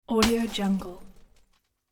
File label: small car window smash